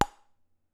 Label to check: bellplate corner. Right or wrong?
Right